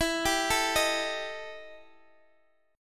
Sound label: E Minor Major 7th Double Flat 5th, E, down, Guitar, Open D